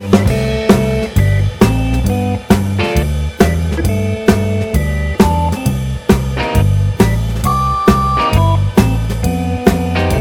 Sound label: happy birthday soul ringtone